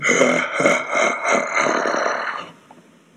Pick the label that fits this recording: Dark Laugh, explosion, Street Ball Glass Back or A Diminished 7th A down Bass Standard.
Dark Laugh